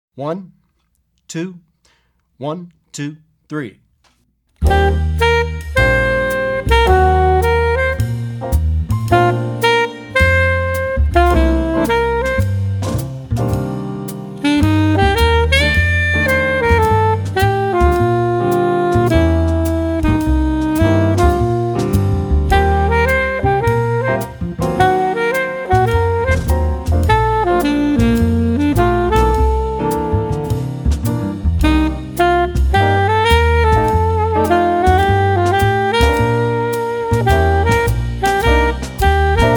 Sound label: With Soloist